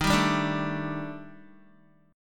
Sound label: D sharp Major 7th Flat 5th D sharp Guitar, chord; D#M7b5 strummed